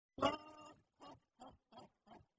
laughter; sagat

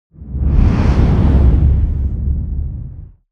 dark storm wind

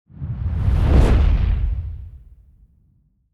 soft woosh fire

